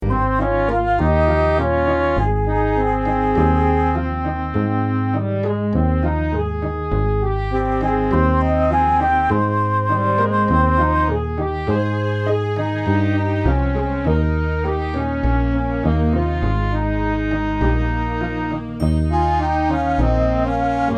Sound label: Romantic Show Tune